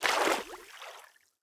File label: stag footstep water hard; Rust